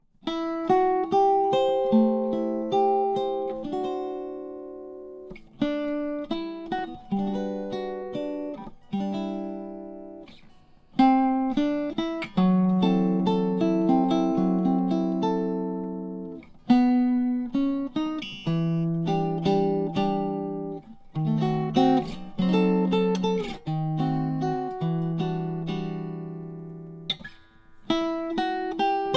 benedetto la venezia; violinburst

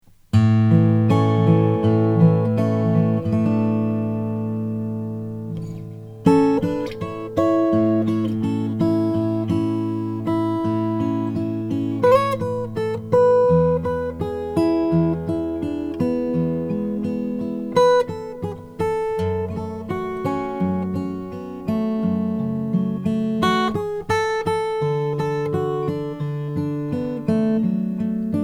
mcpherson mg 4.5xp royal ebony

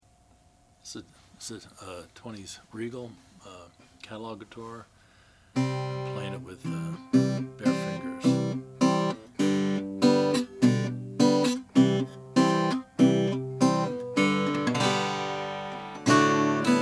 regal standard size natural regal

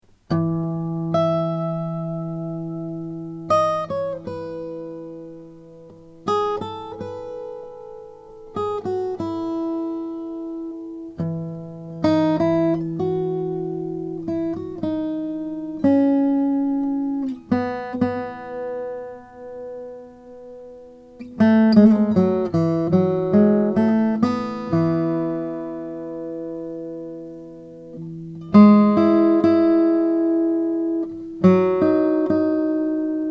collings om3 mra madagascar rosewood

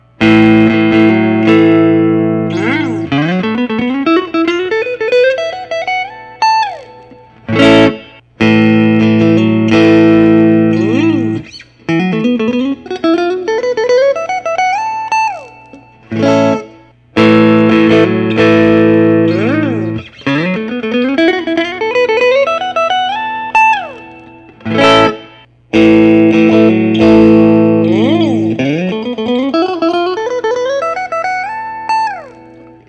lentz reserve s vintage white

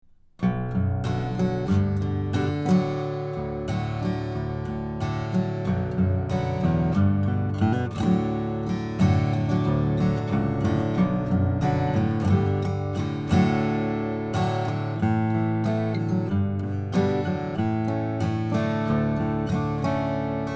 zimnicki baritone cloud cocobolo

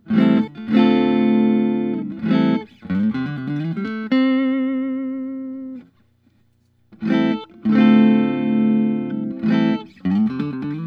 lentz t traditional sonic blue

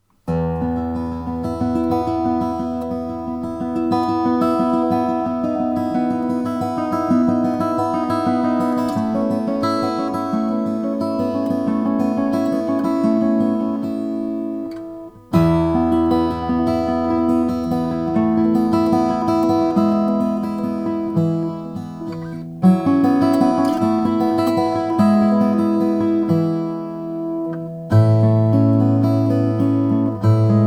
lowden custom s 35mcff

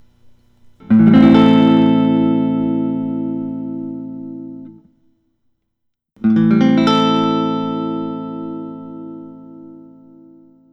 paul reed smith artist series iii emerald

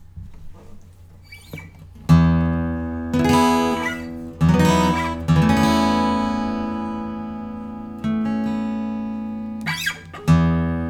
Taylor k26ce koa edgeburst

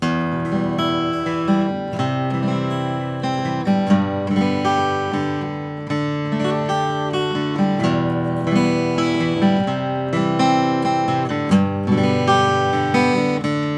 collings 002h torrefied top natural

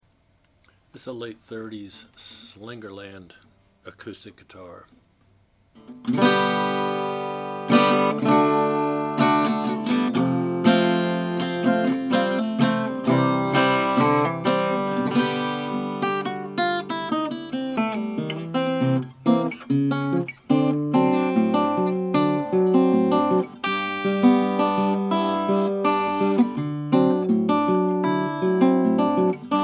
may bell style 0 sunburst; 1937

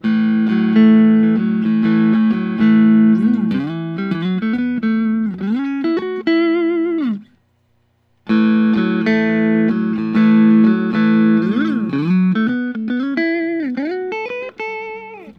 lentz traditional t sonic b

